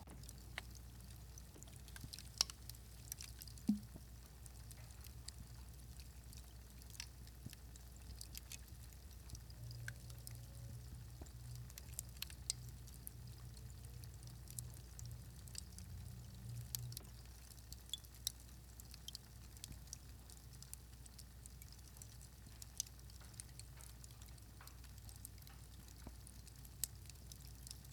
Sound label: Fireplace Crackle; Poetry Project